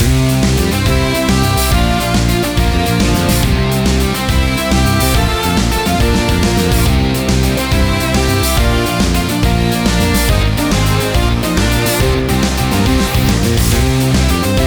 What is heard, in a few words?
Fanfare (Victory); Octogeddon